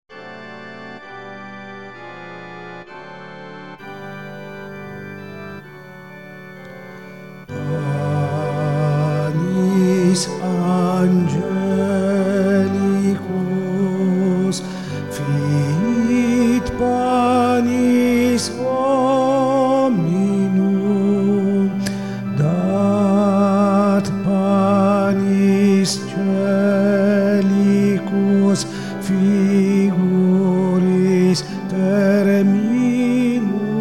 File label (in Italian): panis tenori coro; Panis Angelicus